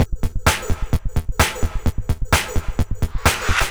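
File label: No bass drum, Drum Loops 129bpm; Zero-G; Soundclash Nu Dance Electro; Acidized Wavs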